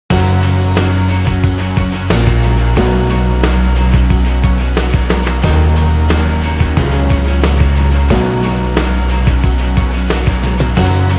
INSTRUMENTAL

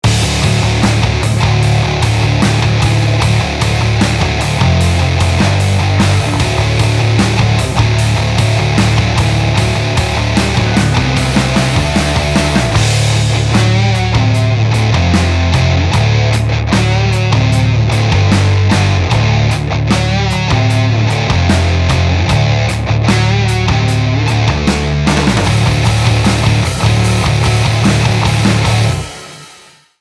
Dirty Dream Pop

